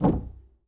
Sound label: Foosball Goalie Rotate